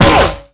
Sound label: Gun Metal, Damage